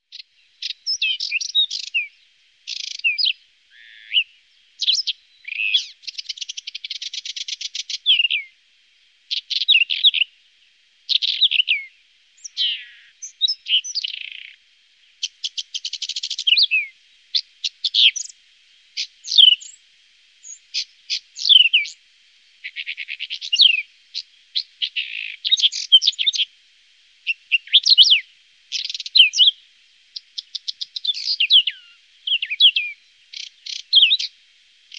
Buskskvatta

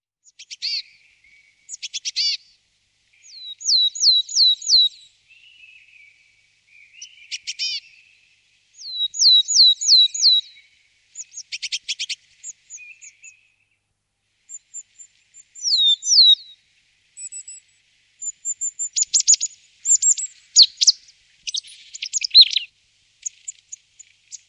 Talltita, Faglar

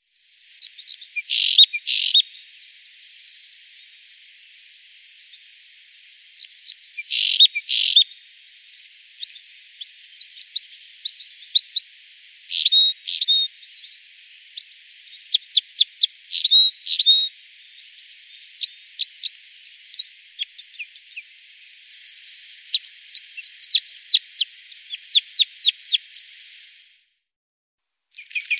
Mindre korsnabb, Faglar

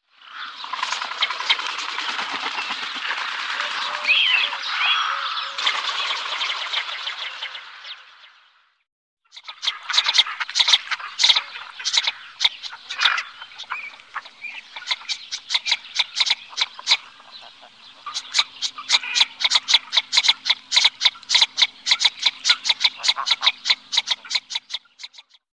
Skedand, Faglar

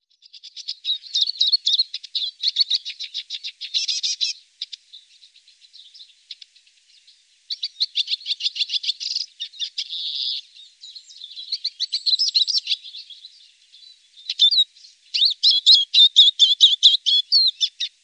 Gronsiska, Faglar

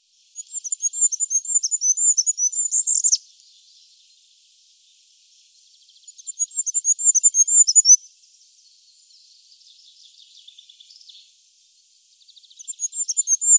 Kungsfagel, Faglar

